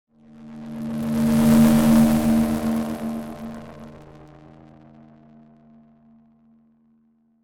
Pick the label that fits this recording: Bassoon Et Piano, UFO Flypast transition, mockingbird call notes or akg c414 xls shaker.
UFO Flypast transition